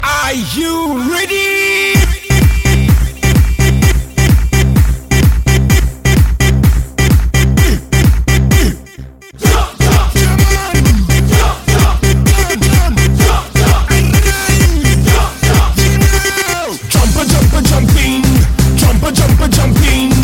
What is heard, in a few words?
electro club music ringtone